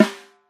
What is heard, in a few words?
deep snare drum g sharp key; snare drum one shots